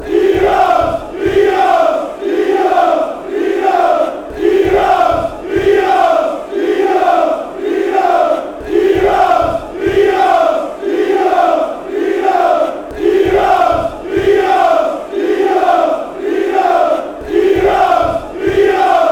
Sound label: eagles, fanchants